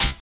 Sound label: snare+hat; DRUMS